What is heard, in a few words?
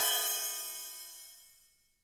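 Cymbal, Real Drum Kit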